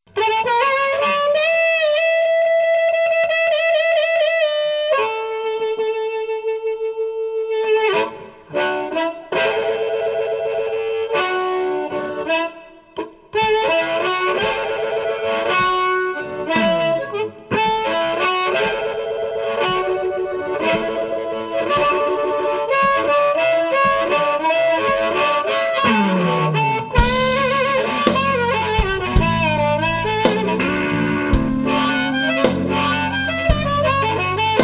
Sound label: Mercy Blues, harmonica blues